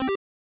confirm; RPG Maker; The Huntress of the Hollow v1.2

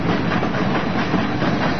rail; RPG Maker; Legend of Heroen v1.20

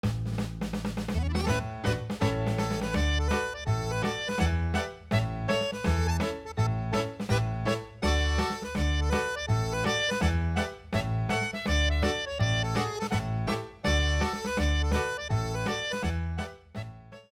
BGM Folkdance; PAPER MARIO Color Splash; wiiu

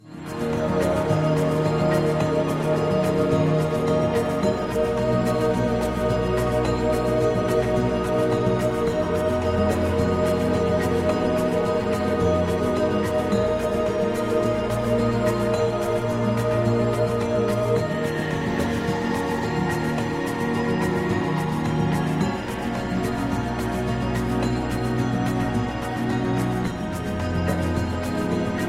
MUSIC SPIRIT FOREST; RPG Maker; Legend of Heroen v1.20

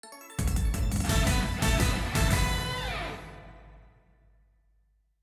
Win; PAPER MARIO Color Splash; wiiu

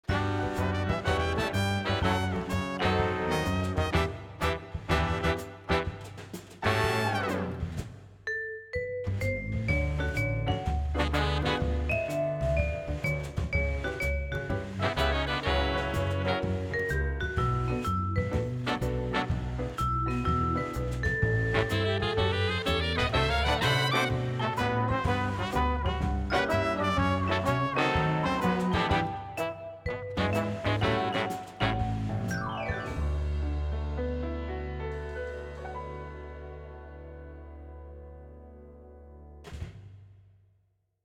BGM; PAPER MARIO Color Splash; wiiu

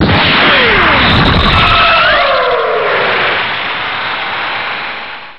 kamehameha fire; DBZ

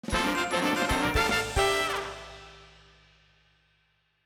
Level Up; PAPER MARIO Color Splash; wiiu

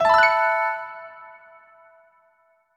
save sfx; RPG Maker; Grimm's Hollow

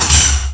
Armor Heavy; RPG Maker; Wartools v1.14

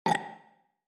burp; RPG Maker; Grimm's Hollow

